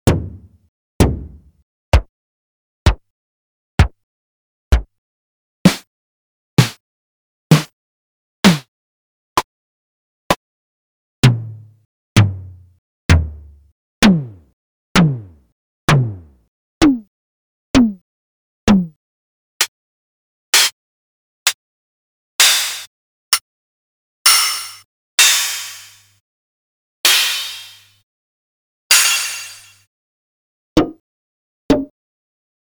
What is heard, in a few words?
mfb712 drums; talkingtothedifferentdrums